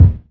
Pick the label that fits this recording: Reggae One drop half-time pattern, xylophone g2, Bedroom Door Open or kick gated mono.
kick gated mono